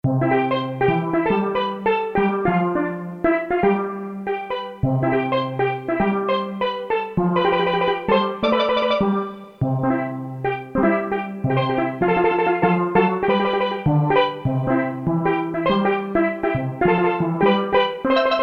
St.Dr., Ethnic Perc, Korg DSS-1

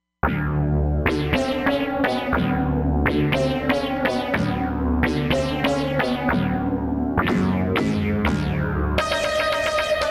Wired Rez, Roland Fantom XR

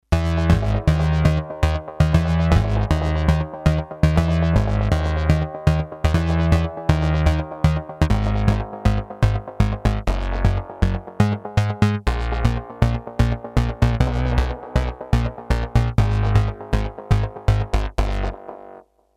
Bass

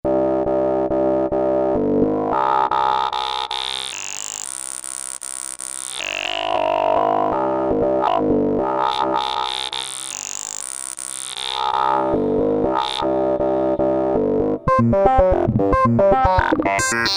Hi Pas Seq MW

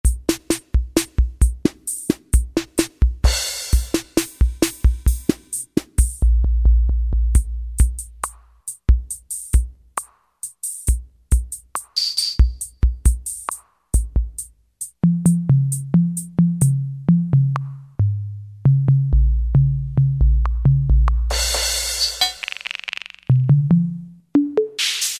Kit; Vintage Synthesizers And Classic Beatboxes

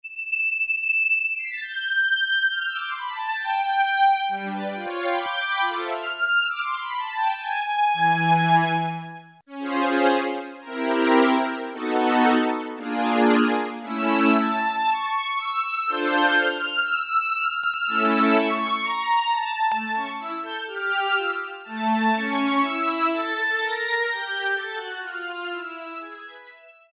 Kawai SX-240 HISTRING

